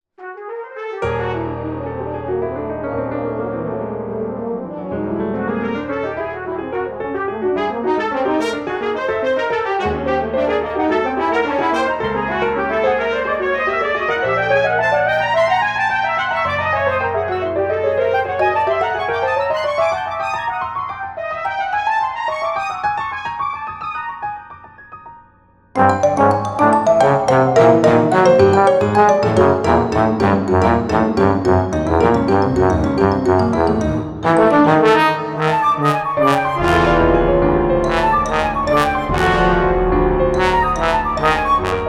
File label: Multi Instrumental